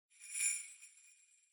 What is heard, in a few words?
Sleigh Bells Dry Noise